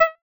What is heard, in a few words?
Tuned Mid Key UI SFX